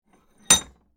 Tool Metal Vise, Industry, Tool, Metal, Vise, Hand, Impact; Hand Tools; BlastWave